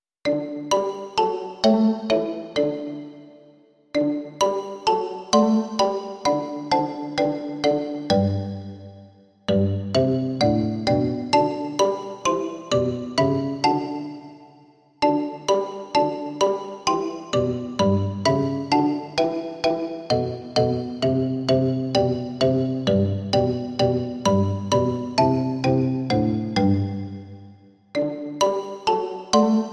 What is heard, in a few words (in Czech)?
xylofon a violoncello; Kdo proti nám; Imaginativní verze